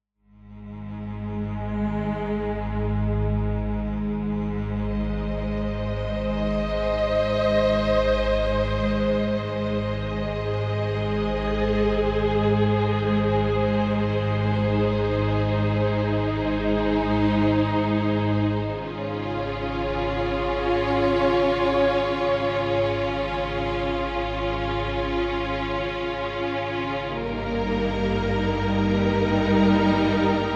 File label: Peace with Emotions